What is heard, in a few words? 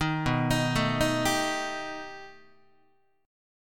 B Augmented 7th B down Guitar; arpeggiated; JGuitar